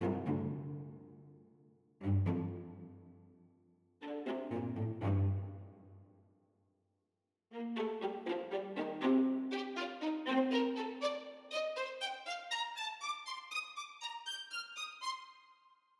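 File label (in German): Streicher, In der Kombination mit Tverb entsteht eine beeindruckend natürliche Raumakustik; Eventide Tverb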